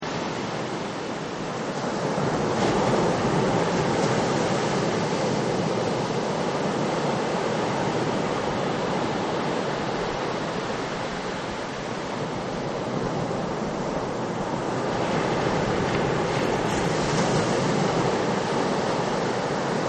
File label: Ocean Surf Big; Ocean And Sea; Sound Effects:Nature